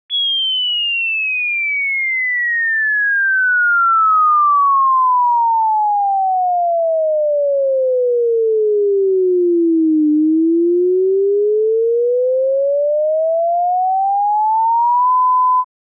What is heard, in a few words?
Pitch